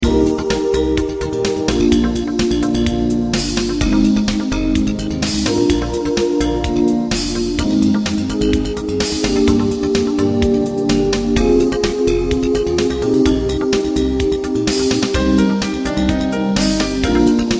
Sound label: Mono 22KHz Excerpt Midnight Soft Shoe